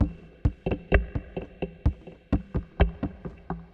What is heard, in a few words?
Slow Walk